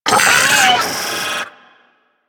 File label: Arachnoid Vocal Leap; Warframe; Corpus; Arachnoid Hunger